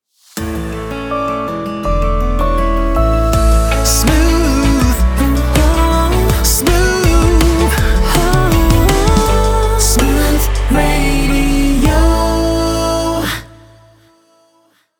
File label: Smooth Radio Jingles